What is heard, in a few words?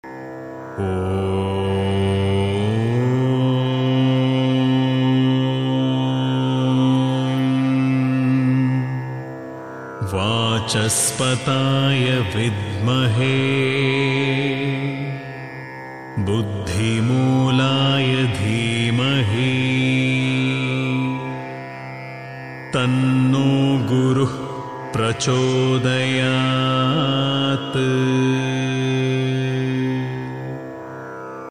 guru gayatri